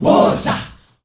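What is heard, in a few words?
wosa group, group chant, Africa; Spectrasonics; Heart of Africa 1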